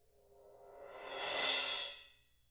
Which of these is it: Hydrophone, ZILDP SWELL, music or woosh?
ZILDP SWELL